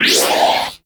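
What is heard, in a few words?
Ability Cast; Warframe; Cowgirl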